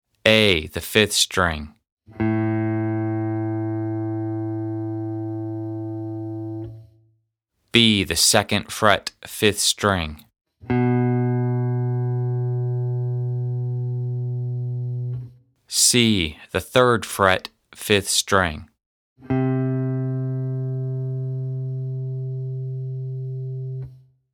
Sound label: Notes on 5th String